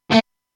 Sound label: reverb; 元音